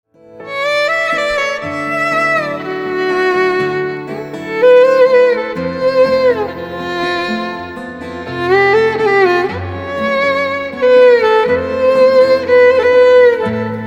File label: Violin; Kalank 2019